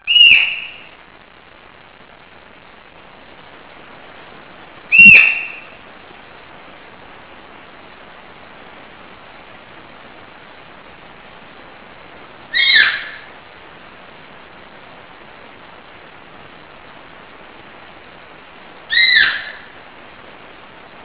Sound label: deer; Sensorium; kawasaki